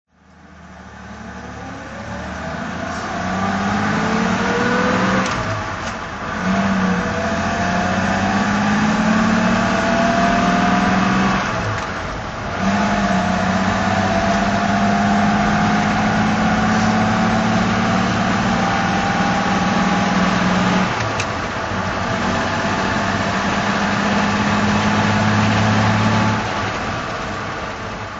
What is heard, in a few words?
etype 4.2 fhc drive